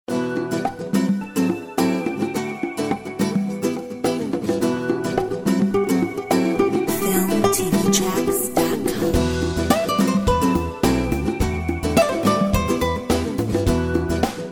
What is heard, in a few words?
Flamenco; Filmtv-tracks